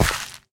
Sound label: gravel, steps; mc gravel; the monster plane; kolmods